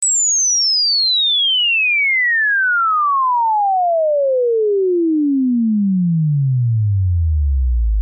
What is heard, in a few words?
REVERSO Sine 50-8000 hz 0dB sine